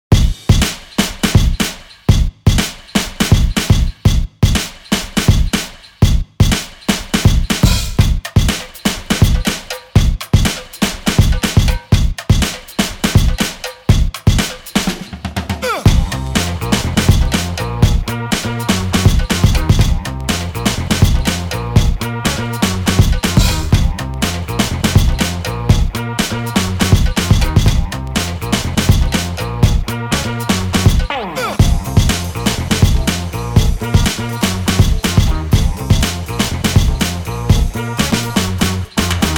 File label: Bass Bro Break